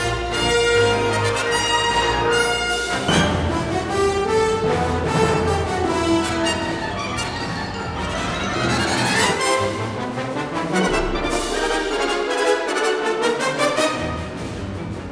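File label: battle loop, music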